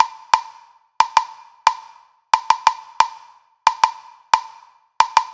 Perc Set

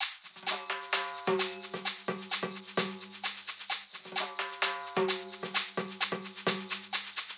Shakers; Latin (Латино)

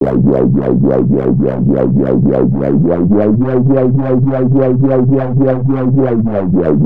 LFO Bass

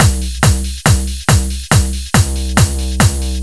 Martial A, hard style

